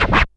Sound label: scratch; foxdot default